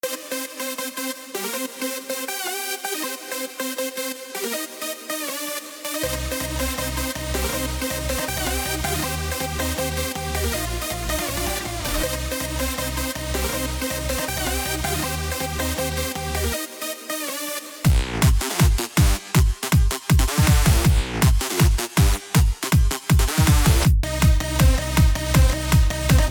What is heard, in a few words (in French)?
synthetiseur remix